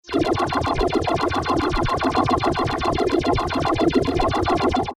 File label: Death Ray Pulsing Laser Constant Blast; Steady; Scifi Science Fiction Cartoon